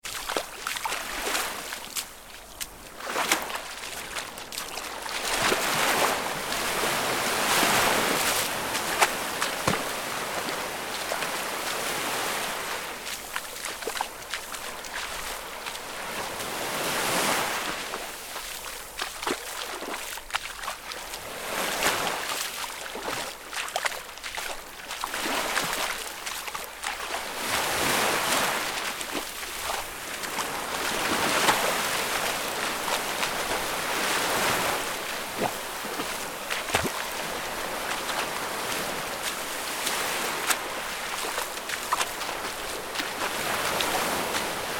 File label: Sound effect of walking through water